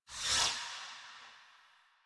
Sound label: Generic Footstep; Warframe; Choir; Foley